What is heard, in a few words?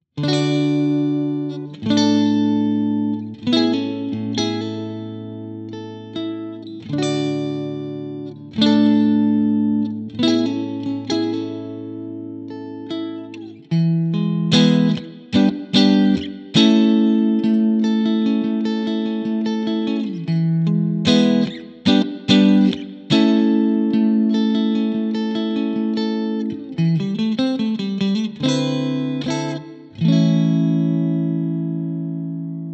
clean; jensentone